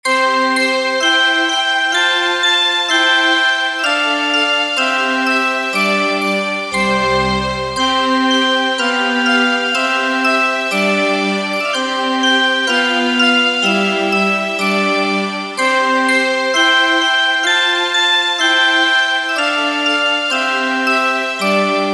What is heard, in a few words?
bell str; CMS Weihnachtskalender 2023